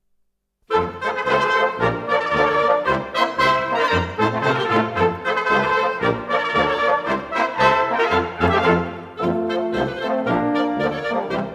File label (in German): Polka, Blasmusik